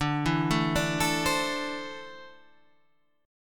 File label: D 7th Suspended 2nd down Guitar Half Step Down